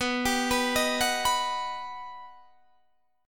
G Augmented Major 7th

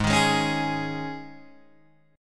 G sharp Suspended 4th G sharp, Guitar Open G; 6,8,6,6,9,6

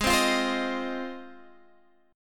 G sharp 6th, Guitar, Half Step Down

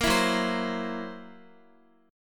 Fsharp 7th Flat 5th down Guitar; F#7b5 strummed

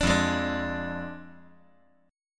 F Minor 6th F down

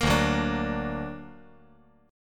D Augmented, chord

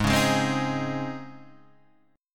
C sharp Major 7th Suspended 2nd, G sharp

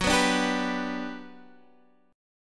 G Major F sharp

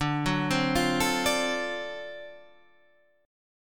D 7th Sharp 9th D down Guitar Drop D

